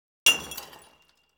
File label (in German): zersplitterndes glas; geraeusche digital